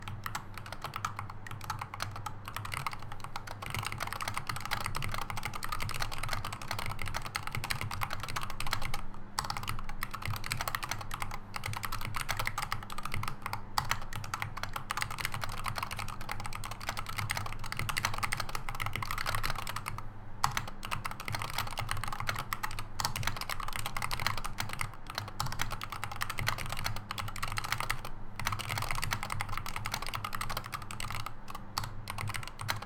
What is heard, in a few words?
Wooting Two HE soundtest